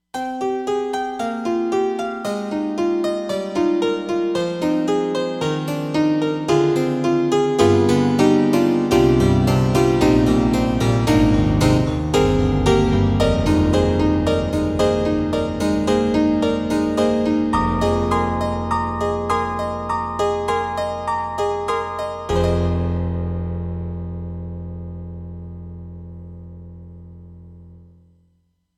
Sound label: Brite Piano; Roland SD-50